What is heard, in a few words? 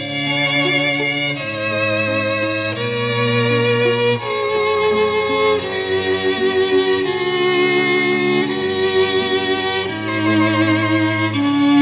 Canon in D